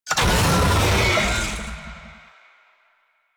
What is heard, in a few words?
Infested Oni Vocal Pain; Warframe